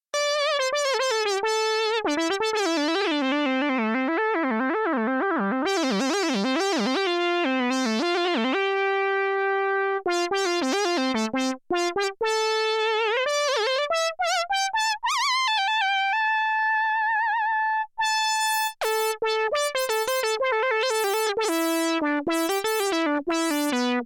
Clean Moog, played on a MiniMoog; ARIA/DIAMOND FUZZ(IBANEZ STANDARD FUZZ)

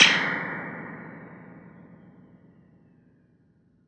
Vox 710 reverb IR

